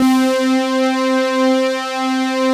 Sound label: FAT SAW PAD; Pro-Rec - Synthsonic